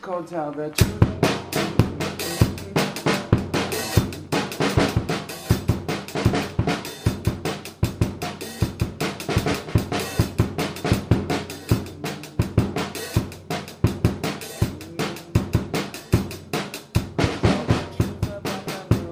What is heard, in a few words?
drum update